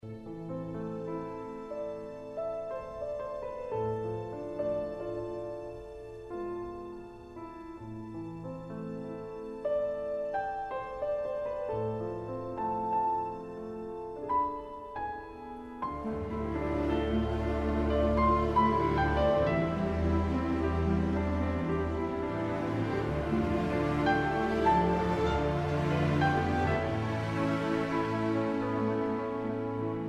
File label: Solo